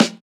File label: hiphop snare, Effect on